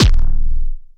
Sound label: kicks, Bass Drum